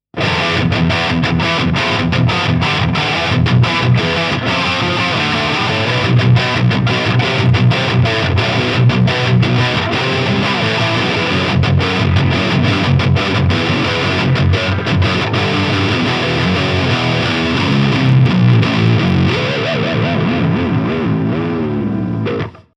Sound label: Jackson Soloist 8 Lead